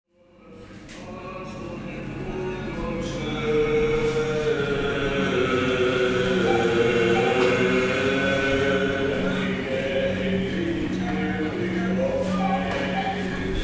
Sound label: supermarket church